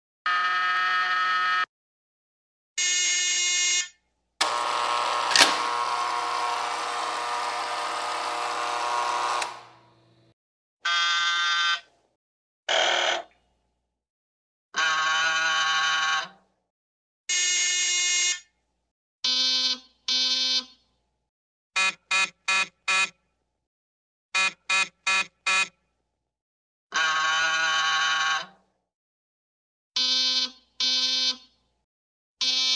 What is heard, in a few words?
Buzzer Apt Door